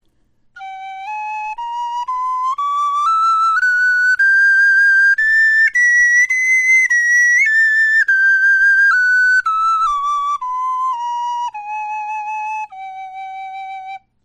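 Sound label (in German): tonleiter, Knochenflöten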